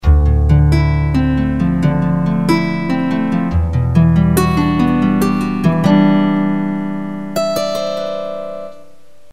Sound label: Guitar